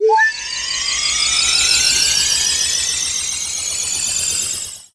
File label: fairy get; zelda